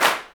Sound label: CLAPS, Africa Handclaps